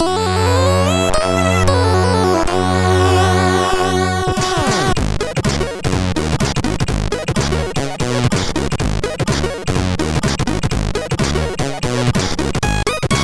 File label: Funk sid MOS6581R3